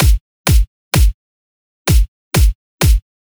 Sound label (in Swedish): Kalibrering Loop (128bpm)